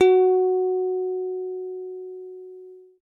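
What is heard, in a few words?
guitar tones string A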